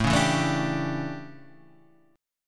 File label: D Major 7th Suspended 2nd Suspended 4th, chord